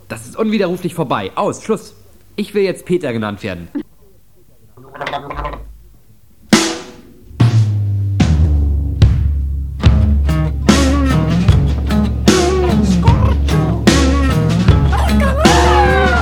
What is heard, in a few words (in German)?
Mixtape Tapedeck